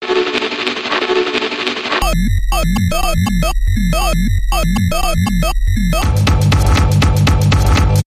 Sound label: True Grit Electronic Bumper